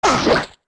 Hit; Odolwa getting hit

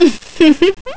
Girl Laugh

